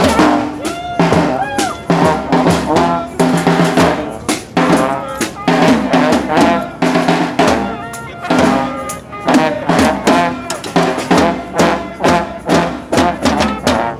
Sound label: bandmusic